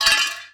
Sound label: Lamp Collision; angry birds rio